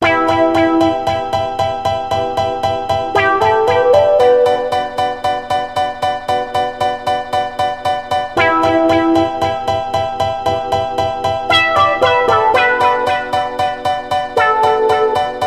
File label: Ringtone